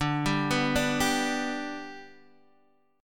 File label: G Major D